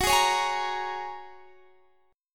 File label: Ab 7th Suspended 2nd Suspended 4th, Gb